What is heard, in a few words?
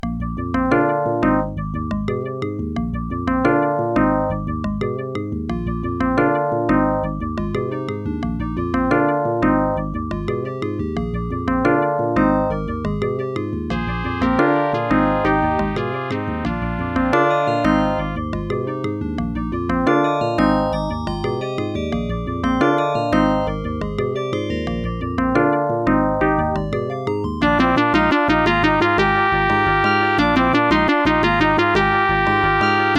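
level1, Creative SoundBlaster 2.0 ct1350; soundcard test; Hocus Pocus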